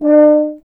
tuba; Miroslav Vitous - Solo Instruments VOL-2

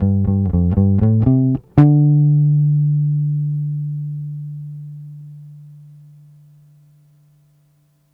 Bass Ending, 70s Analog Funk; Sonic Foundry (Sony Creative Software); What it Is! 70s Analog Funk

